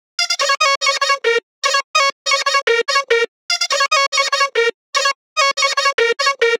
Pepa Chop BPM 145 A Min; Gully Loops

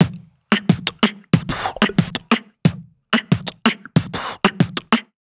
Beat Box Drum Loop; aawaz

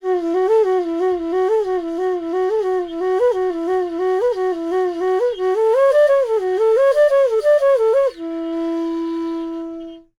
Hip Hop Flute Loop A

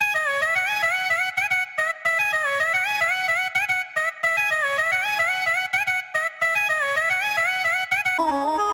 Baraat Loop BPM 110 E; Gully Loops

